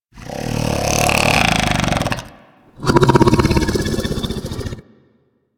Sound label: Vocal Snore, critter; Warframe; Hunting Critters; Undazoa